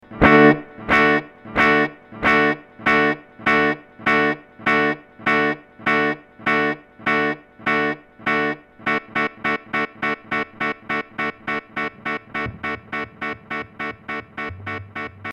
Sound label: tempo change dl